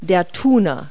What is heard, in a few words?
tuner; Multi-Lingual Talking Picture Dictionary